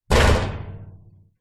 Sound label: industrial metal cabinet door impact close hard; zapsplat